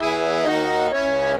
127 South American Accordion Intro E; World

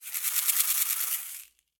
Caxixi FX; Latin Percussion; Drums; Drum Hits; Shaker; Ableton; Factory Packs

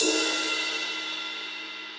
Ride Studio Basic; Drums; Drum Hits; Session Drums Studio; MPE Hits

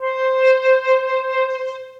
Piccolo Solo Legato Vibrato; Ableton; Orchestral Woodwinds

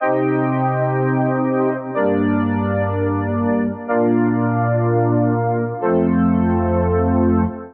Organ (Cm) 124bpm; Music Loops; St. Krillion Organ; Ableton; Factory Packs; Singularities

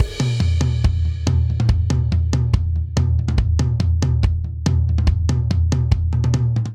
Syncopated Folk Buildup 142 bpm; MIDI Clips; Ableton; Factory Packs; Drum Booth

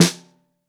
SN Bottom, Snare

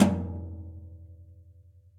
Tom Brush Floor ClubSizzle; drum hits; Ableton; Factory Packs; Session Drums Club; Standard Hits

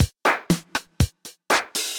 Kit, Effected Drum Kits; Drum Machines; Ableton; Factory Packs

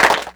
Clapbox Pitch8; Ableton Foundation FreePacks

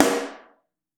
SN Mono, Snare; Ableton Foundation FreePacks; Snare Designer

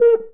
Cuica High; Latin Percussion; Drums; Drum Hits; Ableton; Factory Packs

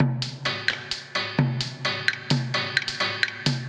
Scrap Metal Kit 130 bpm; MIDI Clips; Drums; Ableton; Factory Packs; Mood Reel

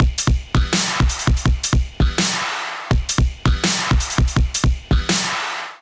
Downer Beat 165 bpm; MIDI Clips; Ableton; Factory Packs; Skitter and Step

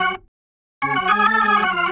125 South HipHop Organ F#m

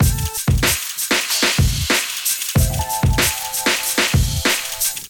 Kit, Drums; Ableton; Factory Packs; Chop and Swing

